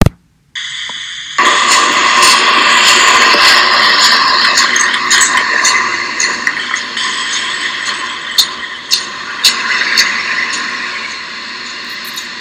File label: Beach